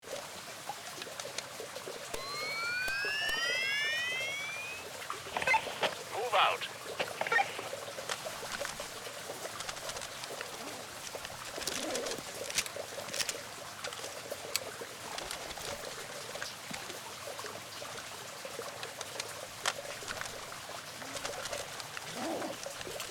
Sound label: Night vision team battlefield preparation